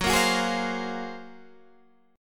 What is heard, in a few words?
F sharp Major Sharp 11th F sharp